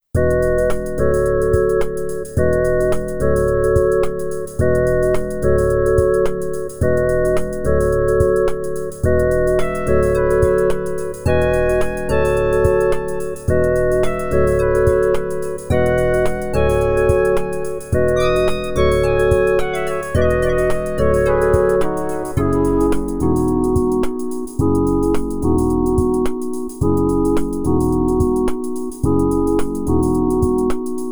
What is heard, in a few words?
synclavier